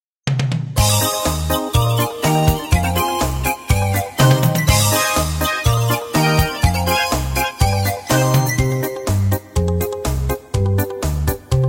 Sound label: instrumental; Прибаутки